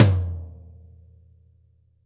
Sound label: tom, drum